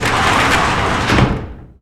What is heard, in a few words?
Door Metal Slide; Doors